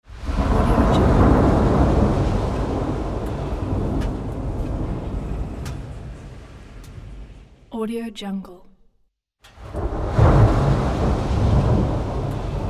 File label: distant thunders